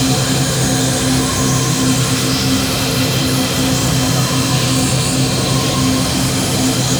Last Portal Open Loop; Warframe; Duviri

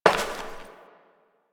Step Glass; Warframe; Koumei

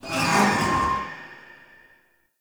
Galleon Metal Screech; Warframe; Grineer Galleon

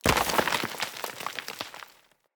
Impact Debris Medium Dirt; Weapon Impacts

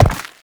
Step Dirt; Warframe; Uriel

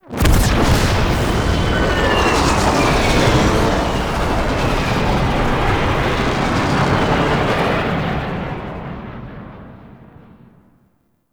Dropship Inbound Stinger; Warframe; Tau; BoroOverlook

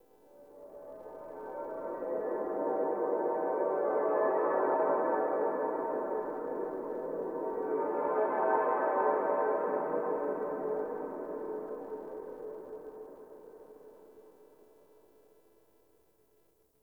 Church Bell; Dagath; Warframe; Ambience; Clan Dojo; Dojo Misc

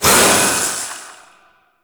Void Release Short, Weapons; Warframe; Duviri; Incarnon Adapters

